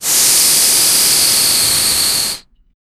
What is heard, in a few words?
air burst